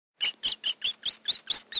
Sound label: Rat Scurrying Ringtone